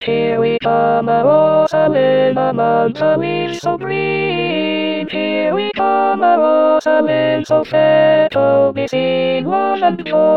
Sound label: Alto; Here We Come a wassailing